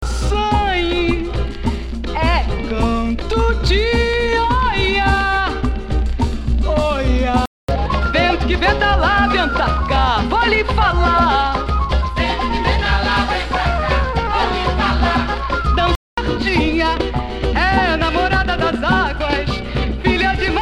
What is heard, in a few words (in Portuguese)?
samba pe no chao; lp vinil